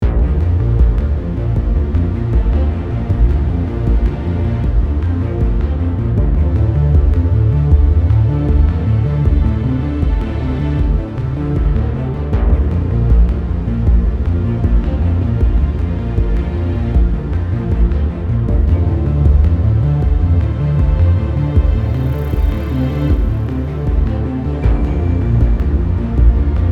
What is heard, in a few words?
background loop